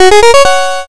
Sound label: level up